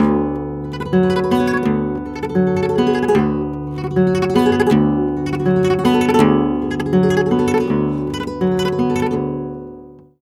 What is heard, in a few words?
Flamenco, Guitar, Tremolos, d